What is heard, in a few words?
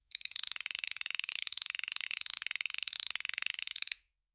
mechanism ratchet moves u, machines